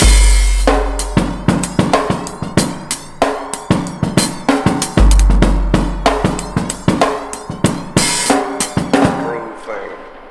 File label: DRM, Breakbeat